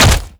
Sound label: step close, zombie blind; Half Life Alyx; creatures